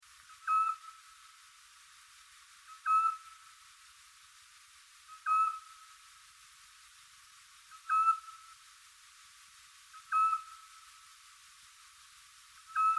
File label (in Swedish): Dvarguv